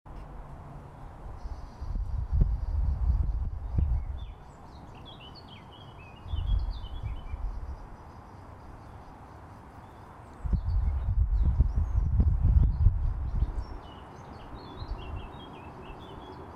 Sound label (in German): Tascam DR40 Aussenaufnahme